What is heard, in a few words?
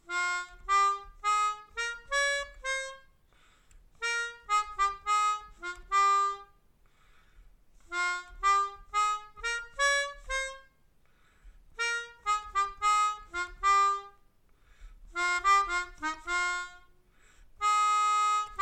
Melodica